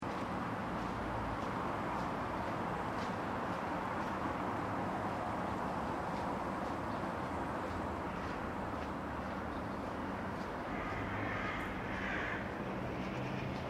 Back Street Calm, Calm enviromental recording of city back street. Some people passing by on asph; medium distant traffic in surroundings. Some bird; City Sound Effects; LynneMusic